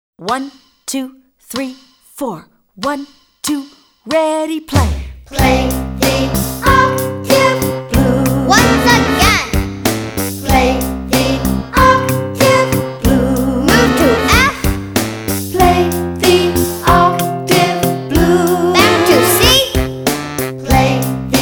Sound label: octave blues